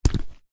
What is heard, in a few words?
foley footstep single boot wet ground puddles